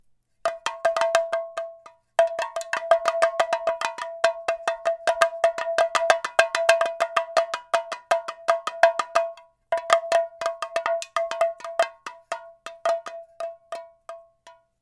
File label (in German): drehtrommel gross